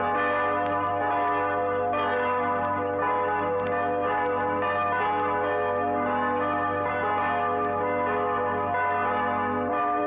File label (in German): glockengelaeut